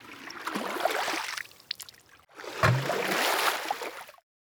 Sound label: scoop